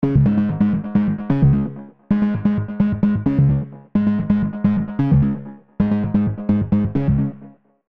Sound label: KORG MS 20 V Dist OPAMP 21 Bass Goes Dunk; Arturia